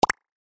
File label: notification beep sound